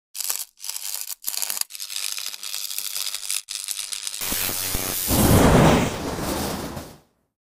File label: Electric pulse; پالس الکتریکی